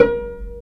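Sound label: strings pizzicato cello, dry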